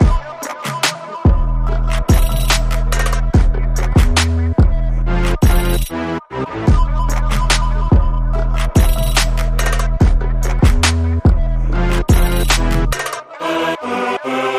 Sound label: Core, No Leadsynth